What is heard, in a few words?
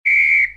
start game; inlogic-basketballmaster